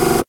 Servo Little Stop

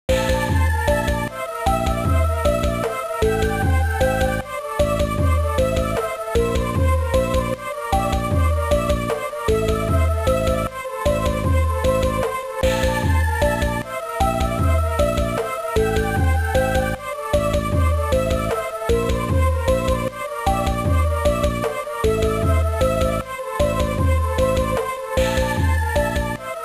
Continue; Game Boy Advance

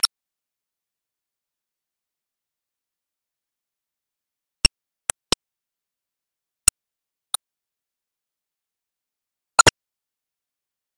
Fight Song; NCAA March Madness 08; PS2, PS3, Xbox 360

